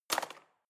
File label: knife wood drawer place hard